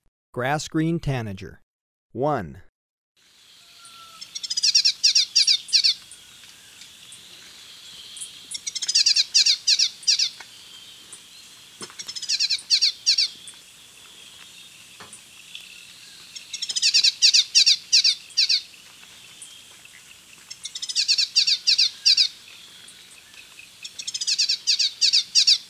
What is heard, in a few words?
Grass-green Tanager, Birds of Ecuador; S. America